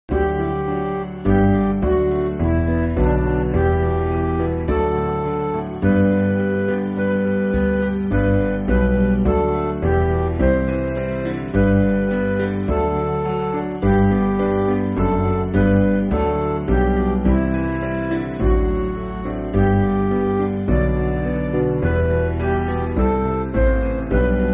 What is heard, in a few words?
Midi; Old 100th; All people that on earth do dwell